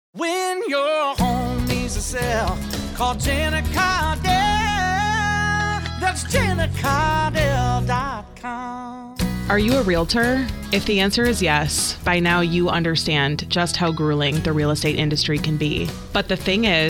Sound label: Recruitment Jingle